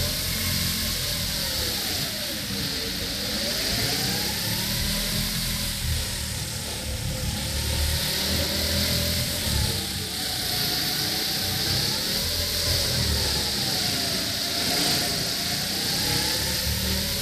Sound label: Wind; Chess-II-Counterattack